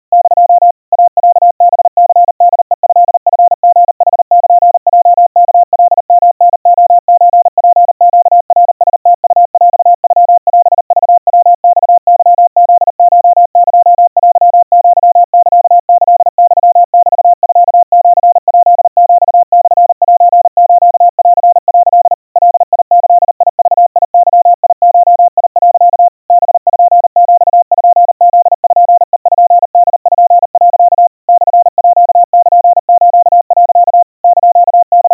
iroha 130 cpm 700 Hz Crispy, Morse

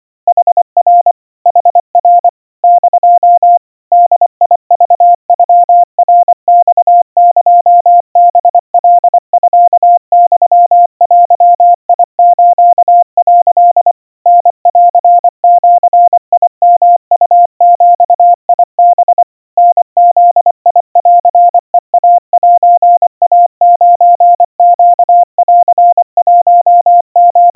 Morse 90 cpm 700 Hz Crispy

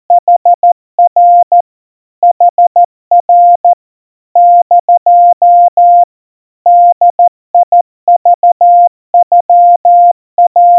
kamyu 50 cpm 700 Hz Crispy, Morse

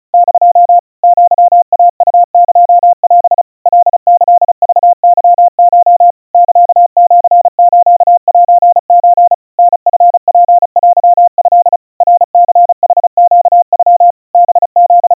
aiueo 120 cpm 700 Hz Crispy, Morse

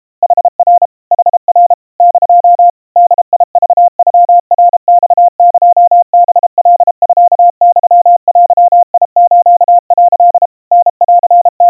kamyu 120 cpm 700 Hz Crispy, Morse

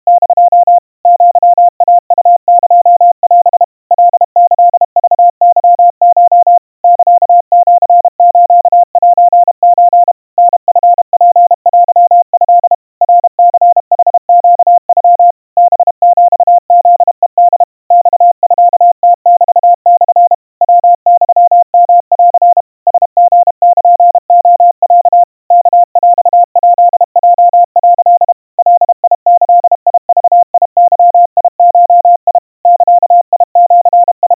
aiueo 110 cpm 700 Hz, Morse

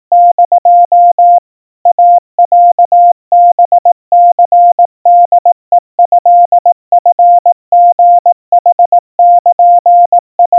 iroha 60 cpm 700 Hz Crispy, Morse

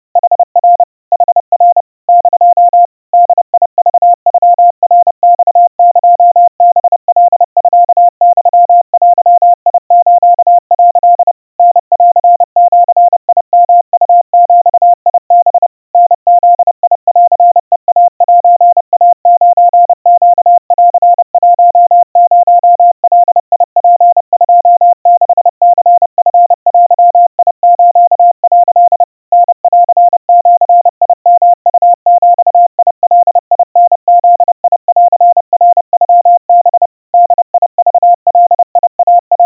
kamyu 110 cpm 700 Hz Crispy, Morse

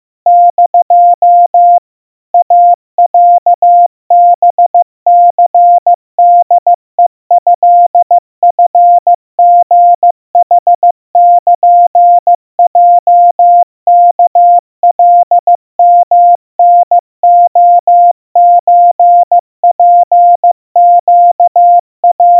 iroha 50 cpm 700 Hz Crispy, Morse

